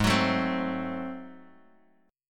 G sharp 7th Flat 9th G sharp down Bass Standard, chord; G#7b9; strummed; JGuitar